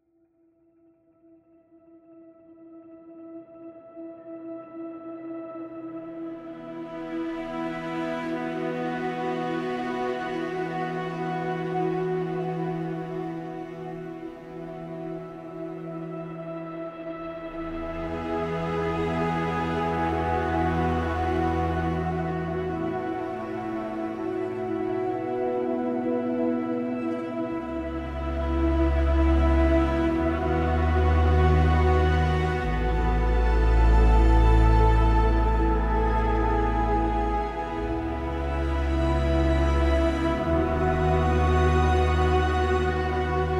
Trailer Music; Microsoft Flight Simulator; Xbox Series X|S; Oceana

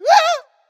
Boss fight Racoon scream

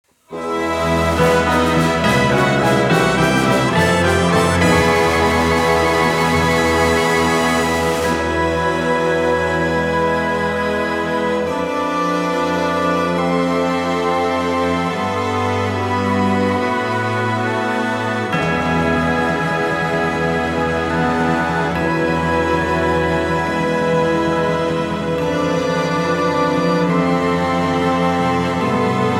Battle Success; Advance Wars 1+2 Re-Boot Camp

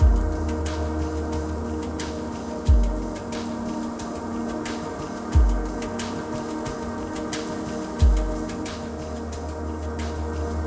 Tunnel; Mirrors Edge

